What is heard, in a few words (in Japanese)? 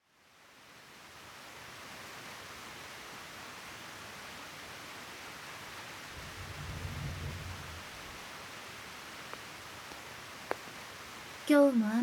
雨音と耳かき(左)